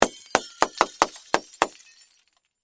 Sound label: Crash Light Bulbs, Crash, Light, Bulbs, Smash, In, Series; Glass; Materials; BlastWave